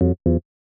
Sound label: 120bpm Chord